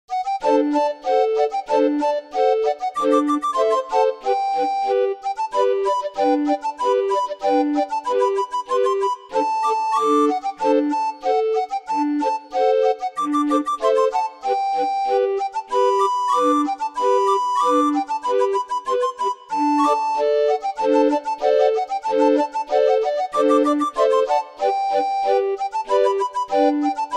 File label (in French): Pollywolly, orgue barbarie